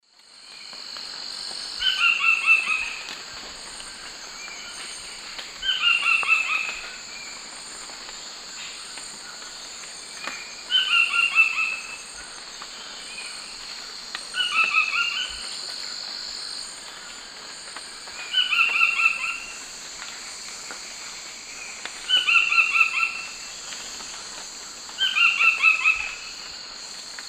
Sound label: Automolus ochrolaemus, song; S. America; Eastern Lowlands